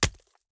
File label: stab punch hit